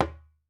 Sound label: WALK DRUM, African Drums; E-MU Formula 4000 Series Vol. 4; Earth Tones